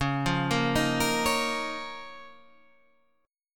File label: D sharp 7th Suspended 2nd C sharp